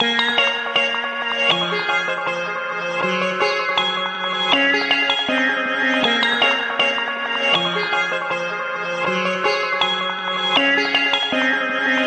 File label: 159 pretty-synth-and-sitar-melody, melodic loops